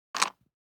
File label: DICE on Cardboard, Grab, Standard, 3 Three Dice; Samples; ArticulatedSounds; DICE Sfx Party (Extended)